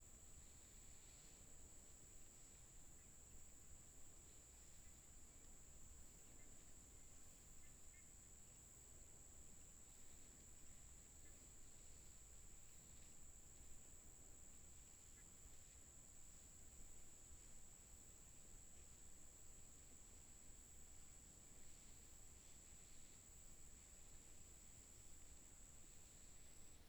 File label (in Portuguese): Grilo constante na estrada em fim de tarde com passaro; Cerrado; insetos; colecao sonora do cerrado